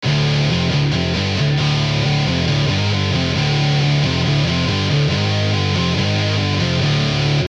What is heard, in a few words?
Metal Zone